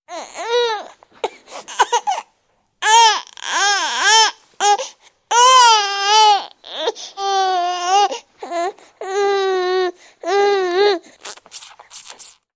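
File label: newborn crying sounds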